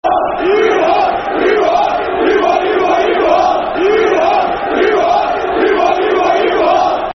You What, You What Football Chant, Cheltenham Town; Soccer Songs; Cheltenham Town Ctfc